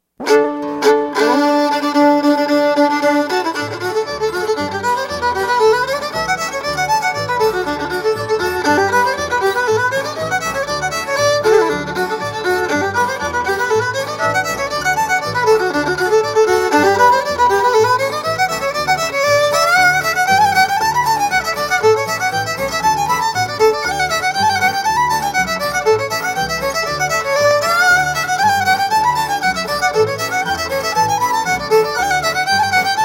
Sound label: Hornpipe